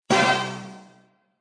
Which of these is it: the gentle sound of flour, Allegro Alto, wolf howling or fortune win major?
fortune win major